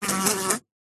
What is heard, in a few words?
insects fly trapped short wing buzz; zapsplat